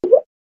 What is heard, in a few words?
drop